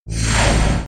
space doors

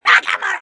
warcry; fallen

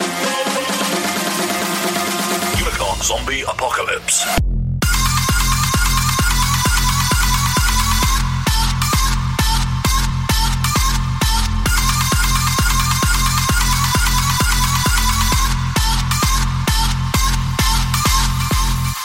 banjo bootleg

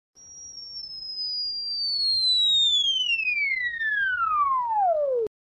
fall whistle; disney

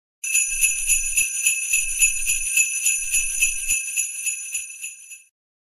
sleigh bells

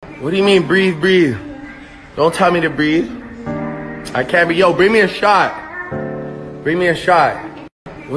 breatheeeeeeeeee

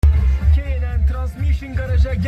transmission garage

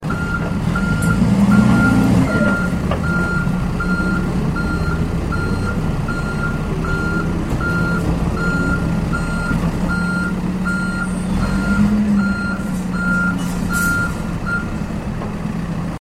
back up beeper; engine sound

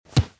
football badge

